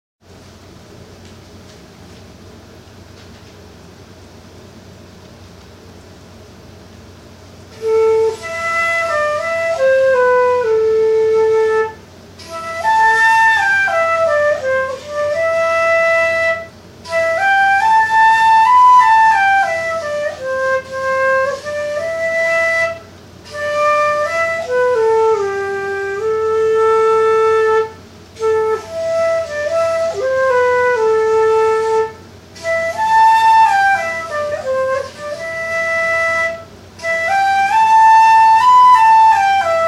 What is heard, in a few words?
flute